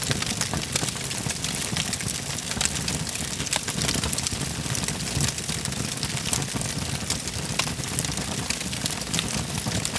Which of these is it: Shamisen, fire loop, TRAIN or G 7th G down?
fire loop